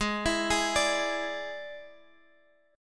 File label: D Suspended 2nd Flat 5th G sharp, guitar